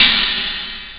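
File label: cool hi-hat; jazz jackrabbit 2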